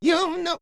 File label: perc voice, Roland MC-909